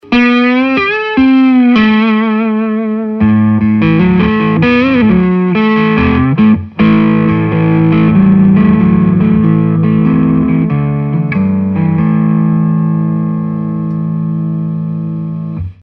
Neck drive, Greco LP Standard; Greco 1979 LP Standard Cherry Sunburst

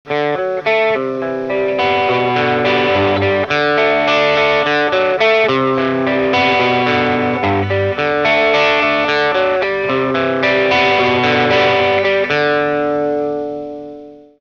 Dirty Arpegio Comping, Guitar Effects Pedals; Pro Co

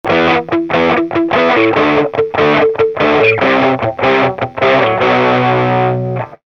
Heavy Rhythm, Guitar Effects Pedals; Pro Co

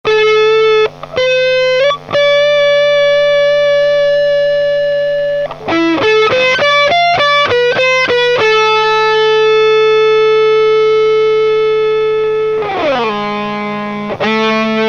Sustain Solo, Guitar Effects Pedals; Pro Co

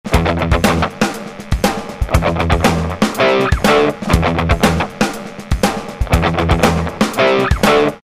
Rock Rhythm With Drums, Guitar Effects Pedals; Pro Co

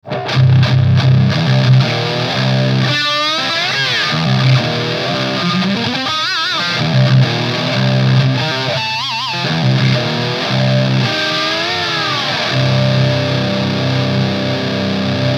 JH2 dist, Marshall; Guitar Effects Pedals

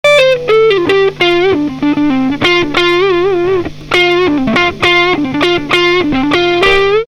Rock Solo, Guitar Effects Pedals; Pro Co

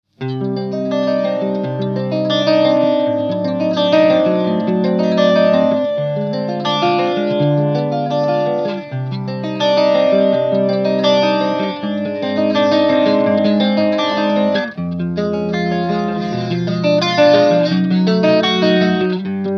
Marshall Guitar Effects Pedals

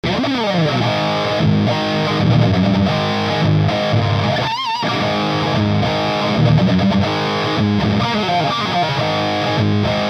Over Drive TS7 TS9, Ibanez; Guitar Effects Pedals

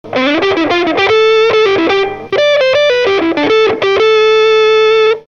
Turbo Fusion Solo, Guitar Effects Pedals; Pro Co

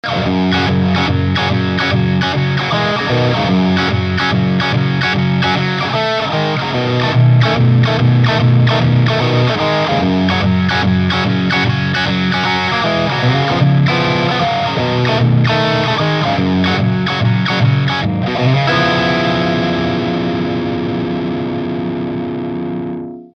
Riff TS7 TS9, Ibanez Guitar Effects Pedals

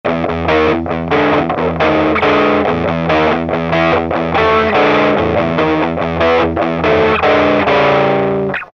Riffs, Guitar Effects Pedals; Pro Co

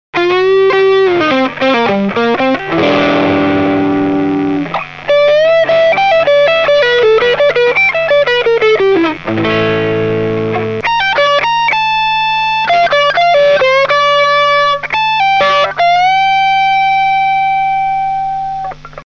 Vintage Rock, Guitar Effects Pedals; Pro Co

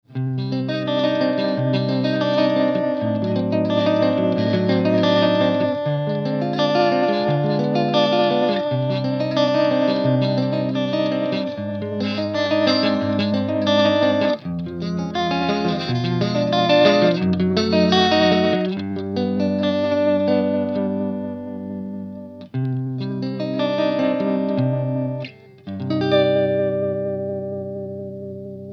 VT vibrato, Marshall Guitar Effects Pedals

